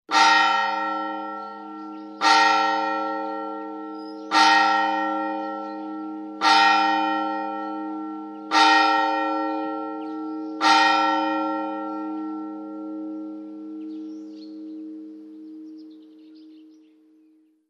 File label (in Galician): campañas iglesia cerca